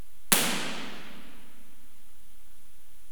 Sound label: IR MLS 18; Impulse-Responses